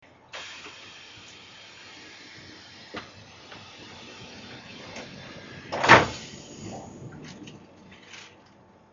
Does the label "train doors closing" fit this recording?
Yes